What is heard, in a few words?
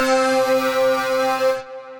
Citrus Bowl Plucks, Guitar & Plucked